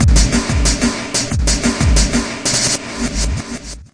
DRUM LOOPS and BREAKS, 185 bpm

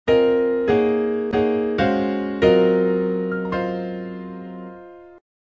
jazzy minor piano chords; KEYS ORGAN PIANO

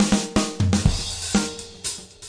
snareintro, 120 bpm; 111 to 120 bpm

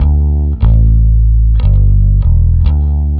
150 chorused happybass

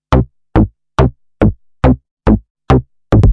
140 silly off bassline

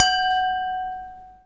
glass camerton ish noise, Crystal Glass Strikes

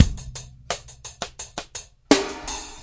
085 sparse rock, drum loops

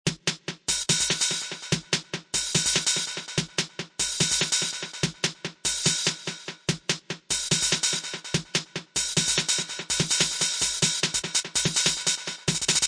loud eko hats, wobble techno

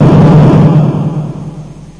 bang, VIDEO GAMES; galaga

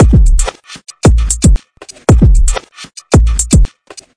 dragging overloaded kicks, 115 bpm

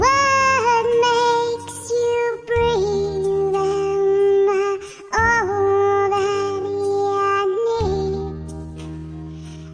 pitched vocal, FEMALE SINGING

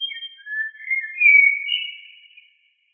atmospheric bird; Zoo and Nature

